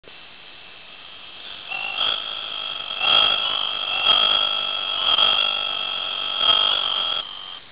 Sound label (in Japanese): suzumushi